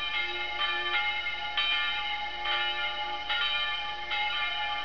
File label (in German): glocke ev kirche, bauwerke; gau weinheim; ortsgemeinde